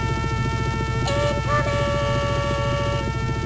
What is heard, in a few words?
incoming